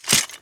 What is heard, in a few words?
metal